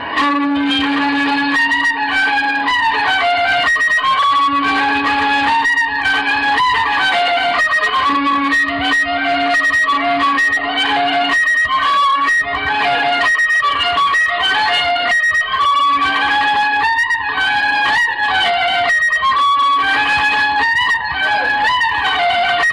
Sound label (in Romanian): joc si cantec popular vasluian; Buleandra; Muzică românească; Centrul Judetean pentru Conservarea si Promovarea Culturii Traditionale Vaslui